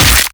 host abandon crack, headcrab reviver, creatures

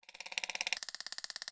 grenade squeeze; infestation; Half Life Alyx; xen

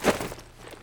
sand, footsteps

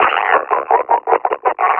laugh, officer; Half Life Alyx

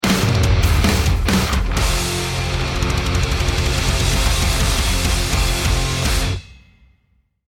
lost round, music

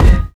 physics cube lg; Portal 2

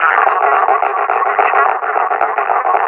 panting, combine officer; Half Life Alyx

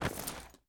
body impact soft

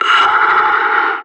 death; Counter Strike; CS:GO; separatist

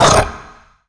vox abandon grunt, zombie

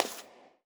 fs tile, footsteps; player; Portal 2

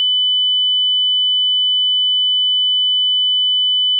explosion ring, flashbang; weapons; Counter Strike Model Pack; CS2

